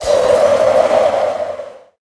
frost

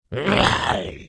zombie

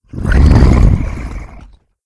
golem

